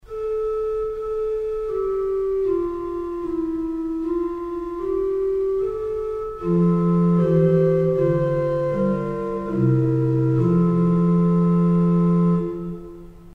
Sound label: Gedackt 8; urkutieto